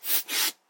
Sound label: blower